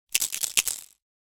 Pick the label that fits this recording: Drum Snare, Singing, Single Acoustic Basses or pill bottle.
pill bottle